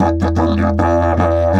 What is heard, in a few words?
150, DIDGE RIFFS